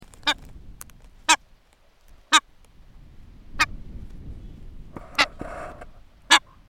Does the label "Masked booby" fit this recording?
Yes